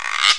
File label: Guiro; Atari Mega Archive - Volume 1; music